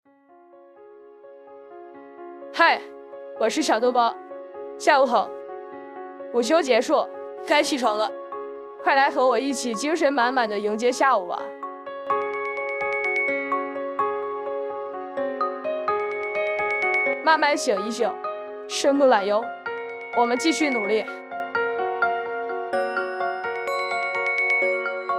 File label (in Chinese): 午休起床闹钟 awakening